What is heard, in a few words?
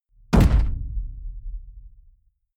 sci-fi space pirate gun fire dull